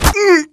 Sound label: pain, player; Team Fortress 2